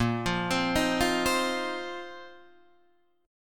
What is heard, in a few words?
Bb Suspended 2nd Suspended 4th, Guitar, DADGAD